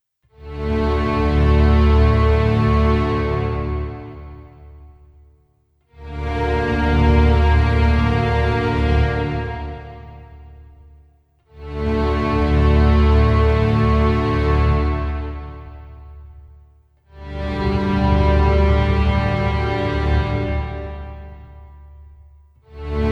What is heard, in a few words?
army patrol orchestra